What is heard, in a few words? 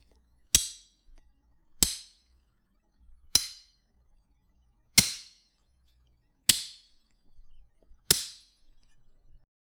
crisp knife cuts through, fruit slice